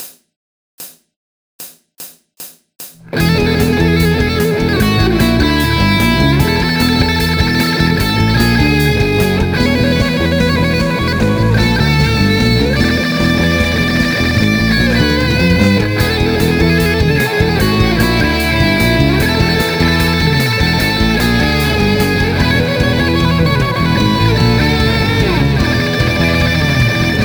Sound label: beatmatch test